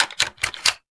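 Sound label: grenade launcher clip out, weapons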